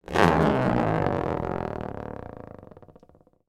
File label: marble single roll across metal rough surface; zapsplat